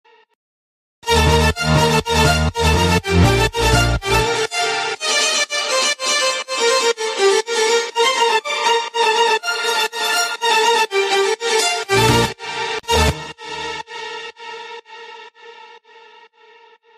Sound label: Ringtone violin